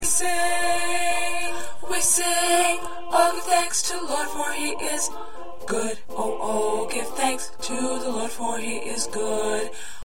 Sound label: 2nd Tenor, choir parts